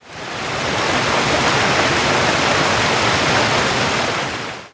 damage vehicle; World Of Warships

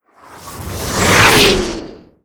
artillery incoming, Explosions; Call of Duty Modern Warfare

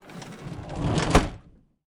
Spitfire LF Mk IX canopy close, aircraft; DCS

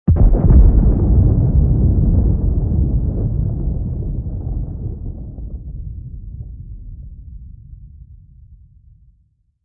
ambient; World of Tanks

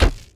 ingame confirm

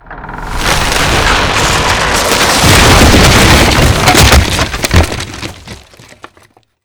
ambush tower crash stereo

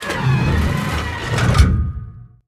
gun reload; World of Tanks

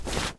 foley leg

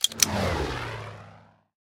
airfield send

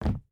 footstep hide wood jump land; Rust

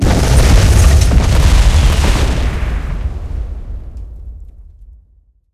blast ammo explode, tanks; killscene

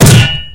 tank hit small unpierce

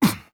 vce land

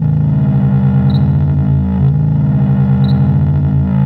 pod force loop

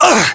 pain

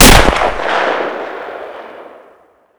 Inf Weapons; Red Orchestra 41-45

